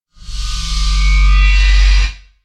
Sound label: Warn Start; SCP-1576